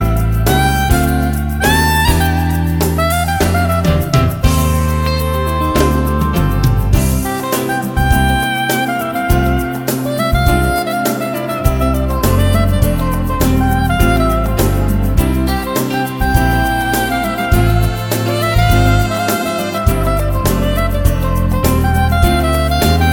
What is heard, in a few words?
Instrumental